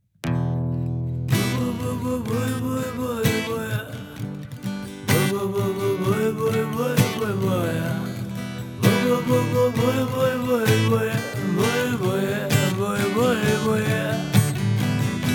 acoustic

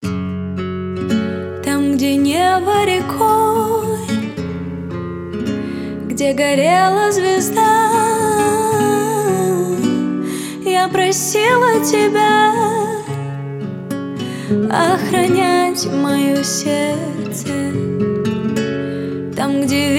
acoustic version

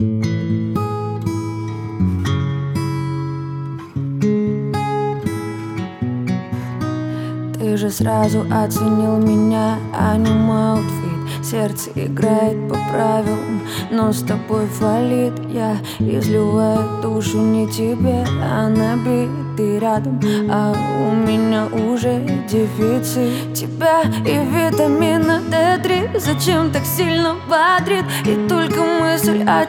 acoustic version

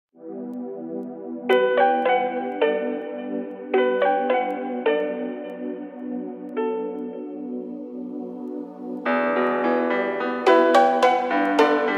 phonk edition

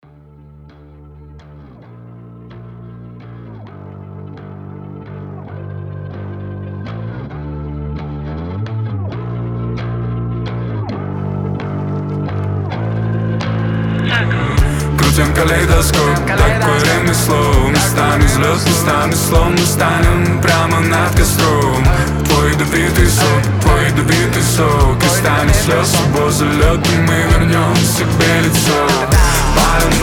LOFI

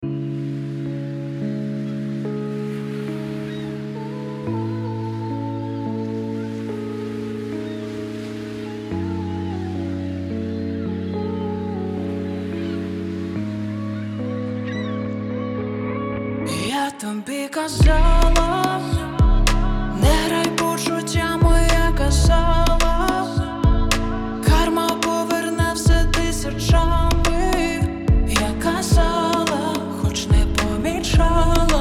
Slowed Reverb

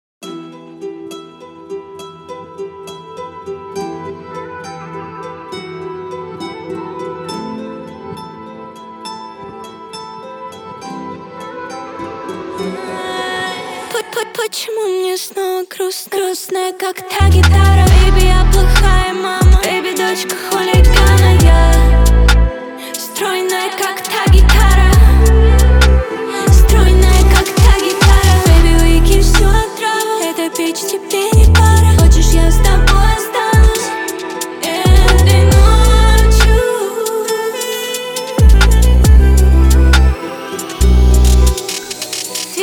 Guitar

